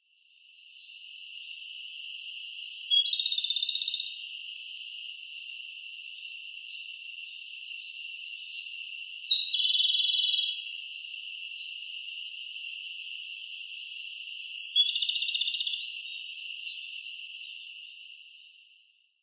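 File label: komadori, Japanese Robin; koe; yaita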